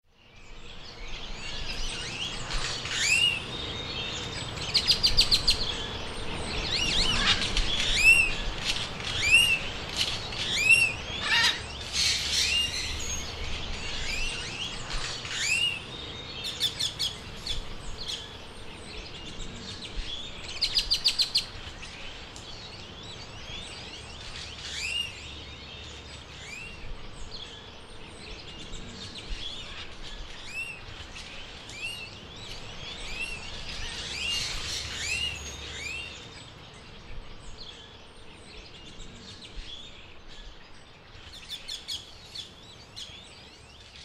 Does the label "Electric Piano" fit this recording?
No